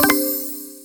Disappear; Game Asset

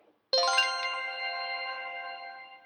ladder

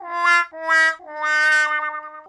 failure cartoon

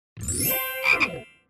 correct ans

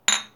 bottle clank

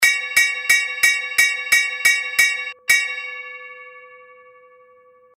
bell sound

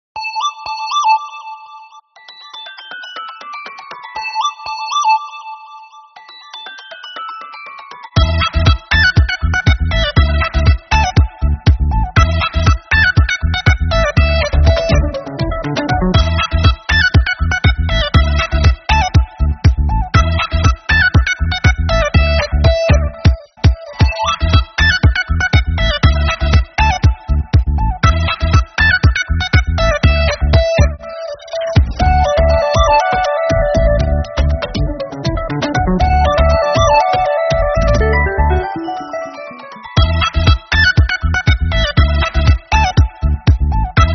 Loading Sound

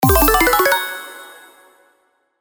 Skill Level Up

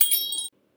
tinkle

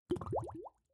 Water Bubbling

